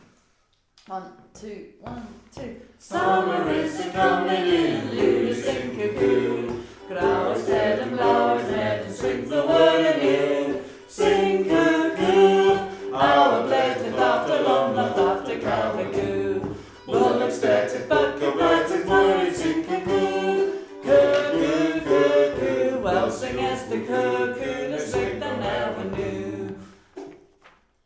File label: sumer is icumen in vocal